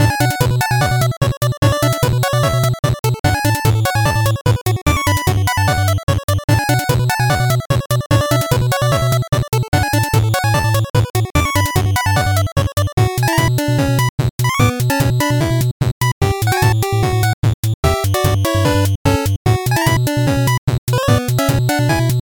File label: happy 8bit